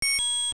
Get Rupee, Get a rupee (also making a selection on the menu); LOZFDS